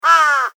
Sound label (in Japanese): カラス